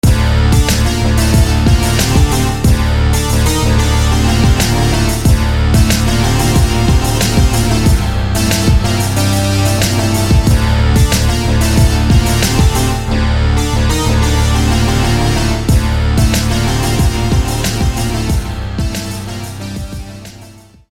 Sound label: Lead; Killah; SFB FX